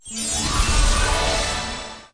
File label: random symbol transform; fairyhansel